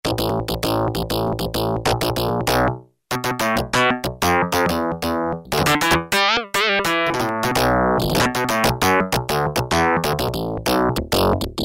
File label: Syn Funk Bas, Yamaha TX81Z